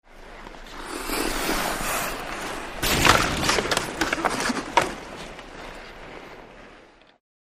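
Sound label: Hockey Player Fight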